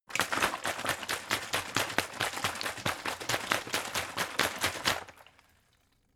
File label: bottle water big shake; gastronomie; berufe